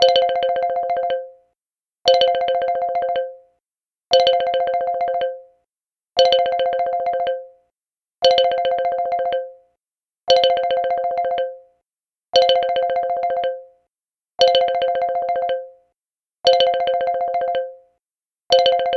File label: Xylophone Bell, ringtones; LG X power 3